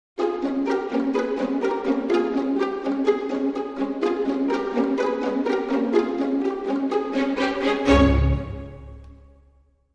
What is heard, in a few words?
Dramedy Sting Eleven, Strings